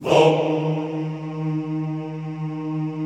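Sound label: VOHMMM D sharp 2, Classical Choir; Best Service; Extended Classical Choir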